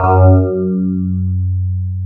DS8 F#2, Korg; Back In Time Records; Korg Universe VOL-1

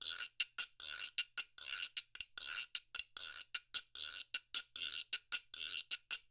Percussion works loops; Sampleheads; NYC Percussionworks; ST LOOPS 76

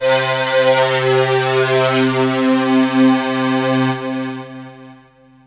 Synth hits and riffs

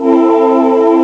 string; ST-XX Amiga Soundtracker Sample Packs

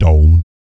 PERC, Vocal, Jazz FX, BASS PERC

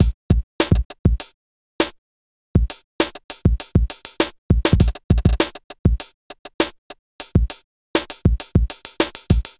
Beat

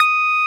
Roxichord, E.Piano

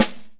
SD 1L R, DRUMKIT

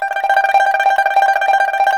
PIPA G6, CHINESE SET; Spectrasonics; Heart of Asia

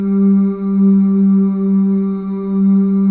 BGV OO

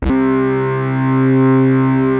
PPG C2, Rare Synthesizer; Hollywood Edge; Giorgio Moroder Rare Synthesizer Collection; PPG.2.3

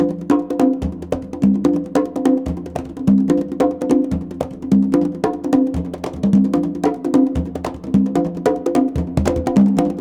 CONGA BEAT; Zero G - Ethnic; CONGA BEATS

